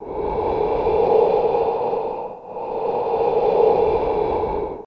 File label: Breath Bottle Eerie